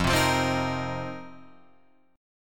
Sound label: F 7th Suspended 4th F down Guitar Open F